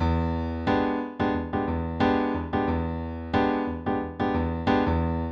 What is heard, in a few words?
Piano vamp